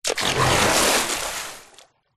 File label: nature water splash deep designed